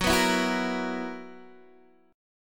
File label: B Major 7th Suspended 4th, F sharp